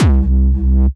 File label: C Bass, DnB One Shots